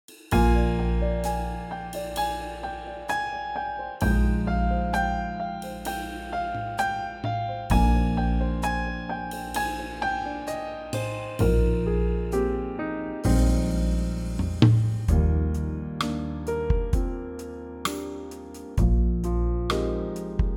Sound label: Eb Trio